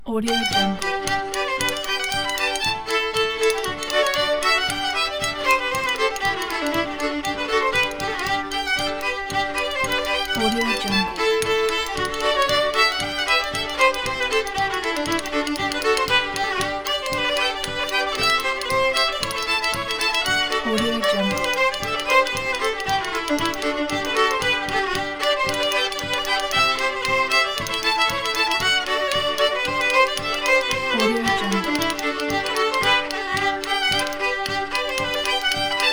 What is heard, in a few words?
sailors hornpipe